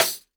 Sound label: jungle snare chromatic, real drums; ProSamples vol.43 - Real Drum Kits